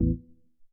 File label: Tek Hit